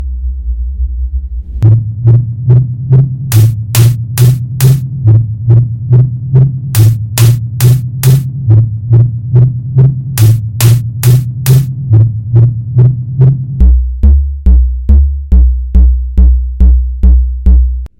Beat Check

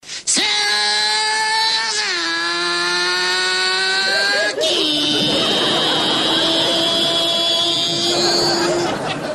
Suzuki

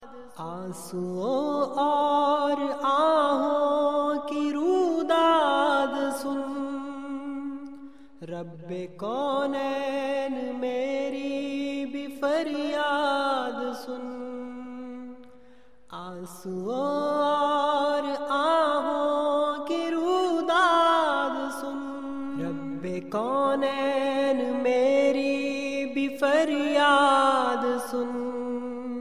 Sad Urdu Tarany

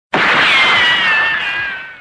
Gun

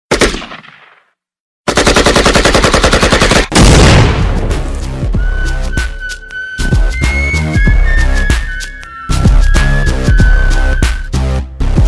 Gun Remix

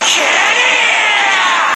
Screaming; Levi; Kenny

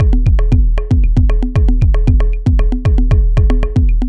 Jungle trap flow

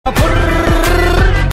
Furrrrrrrrrr

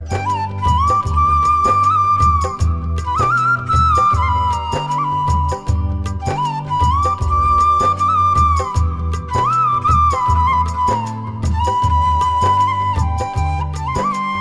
Nice Flute Tone

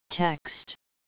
Text message; meloboom

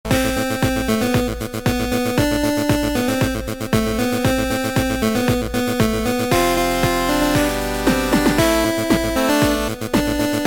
8bit

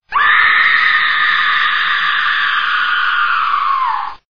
Horror Scream

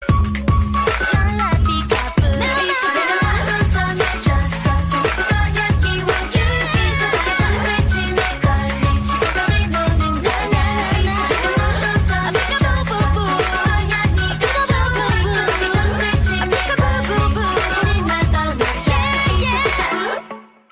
end-chorus

